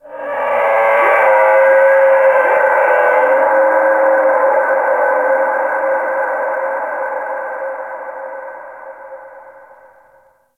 Ice Creature Distant Cry; Warframe; Corpus Ice Planet

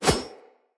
Split Sword Whoosh; Weapons; Swords; Starter Split Sword

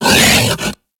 Feral Vocal Melee Attack; Warframe; Kubrow

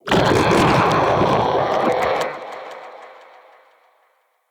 Shrimp Vocal Angry, Creatures; Baliq Shrimp Glade

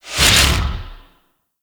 Gun Fu Deactivate; Cowgirl

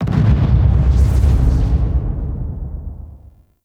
Distant Electric Explosion; Ambience; Warframe; Corpus Railjack

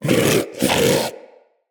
Vulpaphyla Vocal Breathe, Hunting Critters; Characters

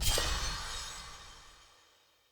UI Menu Open; Eternium Garden

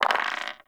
Open Dice Movie; Cetus Vendors; Five Fates

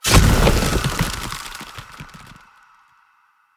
Fire Rain Hit; Powers; Fenn

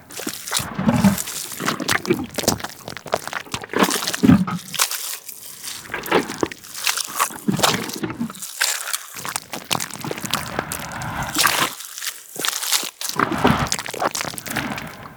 Helminth Idle Loop; Liset Alchemy Lab

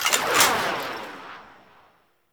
Merchant UI Open; Warframe; Veilbreaker

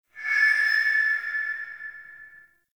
Interior Stadium Feedback; Warframe; Ambience; Nineteen Ninety Nine

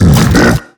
Hand Bomber Idle; Warframe; MiTW; Battle Barks

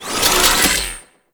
Charge; Warframe; Corpus; Prisma Angstrum

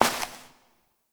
Step Perforated Metal; Warframe; Koumei

